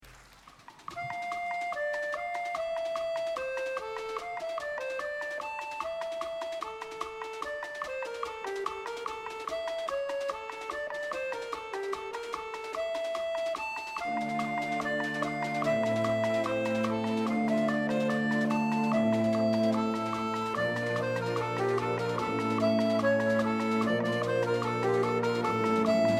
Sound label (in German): mit Brass Band